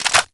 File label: mac10 clip in, weapons; Counter-Strike Global Offensive; csgo